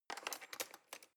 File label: knife wood drawer handle rattle